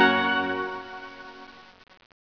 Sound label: SKATING